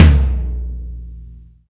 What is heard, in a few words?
Tom3